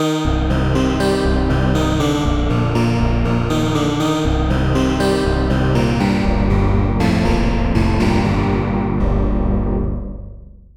Harpsi Piano; straightliner

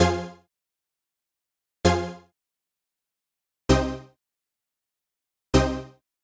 Minor Chord Stabs Dry; echolab

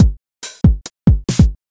Elektro Beat Dry; echolab

